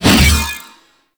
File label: Big Slash Whoosh B; Crp Big Slash